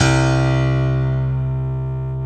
PNO, SY PIANO, House

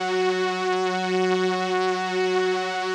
505, String Machines

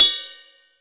Ride Cym Cup, YAMAHA RM1X

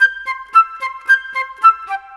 Flute, Cinematic; Sonic Foundry (Sony Creative Software); Orchestral Series VOL-3

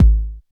K909, KICKS TR 9+8

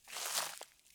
Rustly Step, Footsteps, outdoor

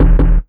Ragga & Dub Reggae PERC; USB Soundscan vol.27; AKAI

